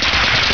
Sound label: uzi short